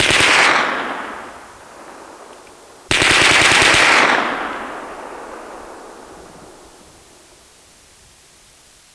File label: Machine gun